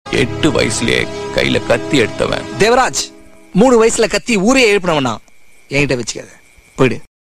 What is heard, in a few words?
Tamil ringtone